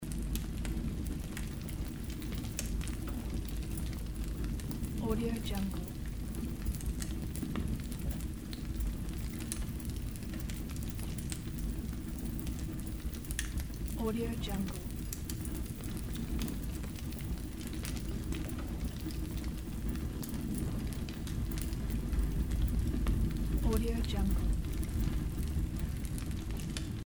fire in barrel loop

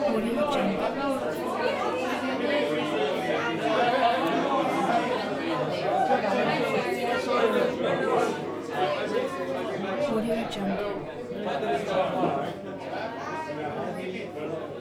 small bar crowd ambience

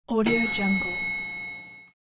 elevator bell loud ding

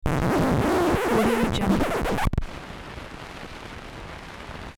record scratches

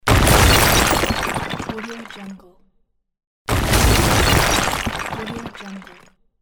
stone house collapsed

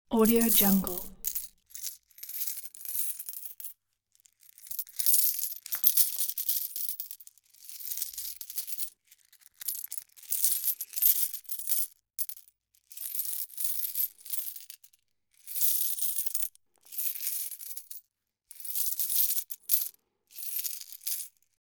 coins in hands

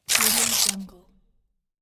paper tearing sound

